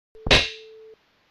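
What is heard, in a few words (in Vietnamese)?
tiếng đứt sợi dây thừng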